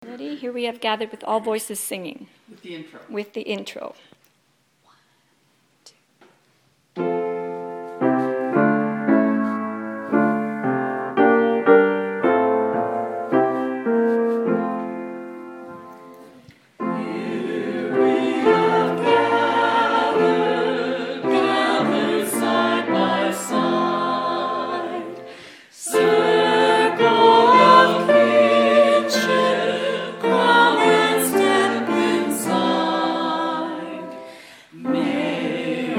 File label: All voices with Piano